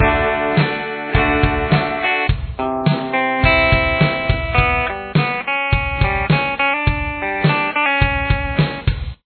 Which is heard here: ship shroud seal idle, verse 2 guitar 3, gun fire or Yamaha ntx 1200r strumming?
verse 2 guitar 3